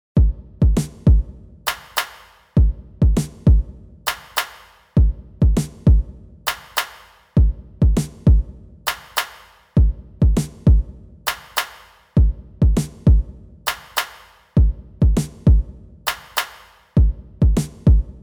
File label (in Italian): ritmia per rap; con salame al cioccolato